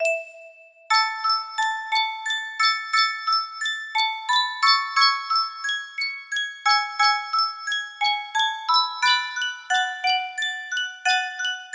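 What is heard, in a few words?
song, music box melody; animal crossing new leaf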